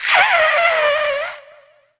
ghost witch voice hiss; Infernal Radiation

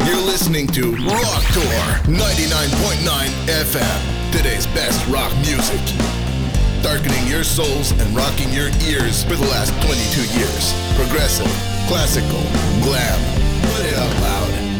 Radio Rock Jingle; Gangstar West Coast Hustle; Android

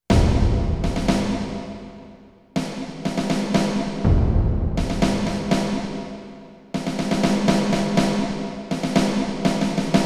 A tense atmospehere; Valkyrie Profile Lenneth

